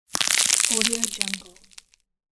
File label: multiple bone fractures; applehillstudios